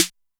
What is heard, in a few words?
snare, Zoom MRT-3B